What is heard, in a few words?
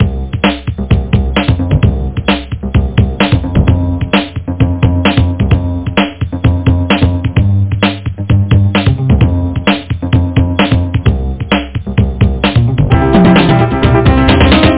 Funk Floor